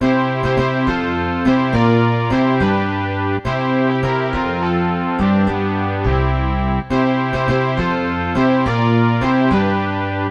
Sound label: Villager Tarantella; Ultima